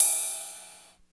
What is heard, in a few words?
RIDE CYM; East West - Phat & Phunky